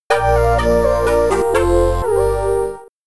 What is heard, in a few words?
Game Over, amiga